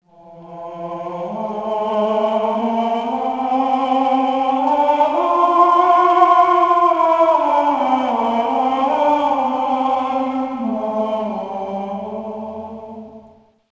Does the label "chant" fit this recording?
Yes